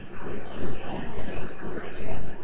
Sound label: summoner summon; propack